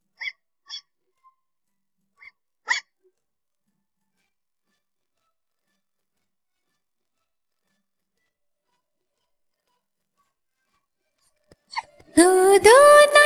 Dho Dho Naikutti Tamil Rhymes for Kids Tamil Rhymes, vocals